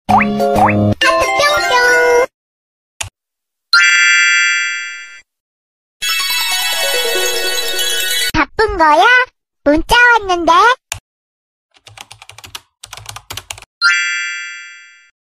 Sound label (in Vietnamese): nhac intro de thuong nhat